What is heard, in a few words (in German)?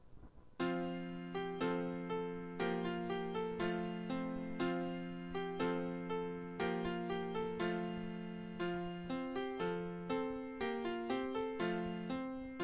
klavier; Alouette